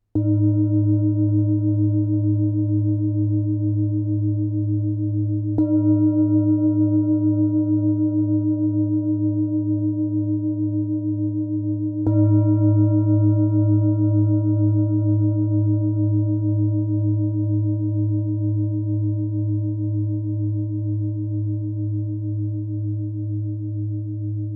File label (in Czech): tibetska misa; g2; 27-5cm